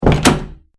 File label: household door wooden internal shut; Door Shutting - Wooden Internal Door Closed; Miscellaneous Doors; McKinneySound